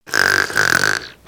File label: snore in